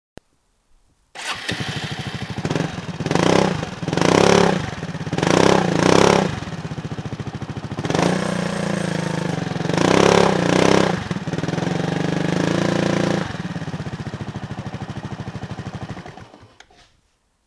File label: Muffler